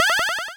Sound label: Cry